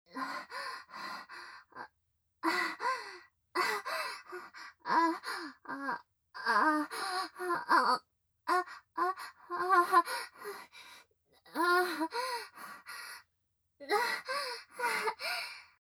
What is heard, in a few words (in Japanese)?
喘ぎ